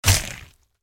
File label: attack contact